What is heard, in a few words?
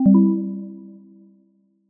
warning; insiteful; sonic branding ux